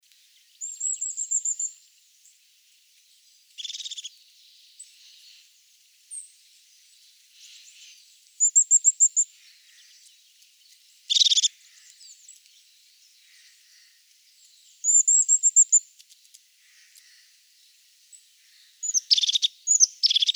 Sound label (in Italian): Cincia dal ciuffo; valsolda